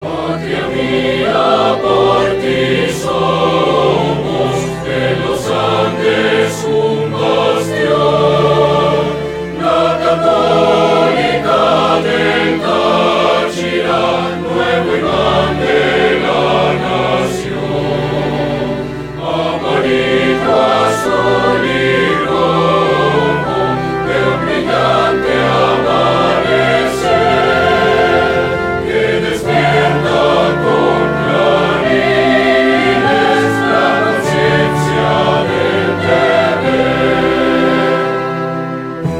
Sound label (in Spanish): Himno; UCAT